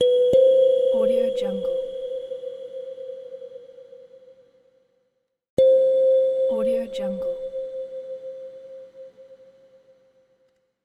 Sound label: gentle indication sounds